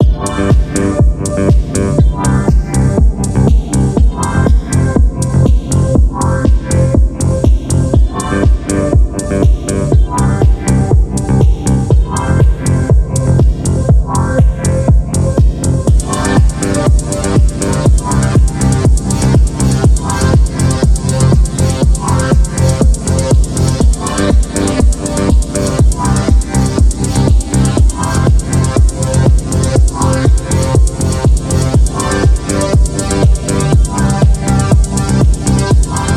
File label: pumping beach